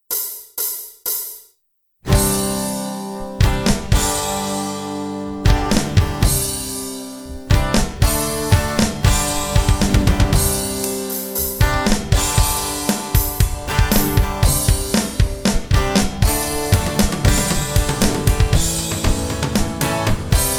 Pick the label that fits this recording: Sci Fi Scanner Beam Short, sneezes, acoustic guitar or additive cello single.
acoustic guitar